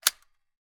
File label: weapon gun ar 15; still north media